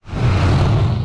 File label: swoop; smite; thanatos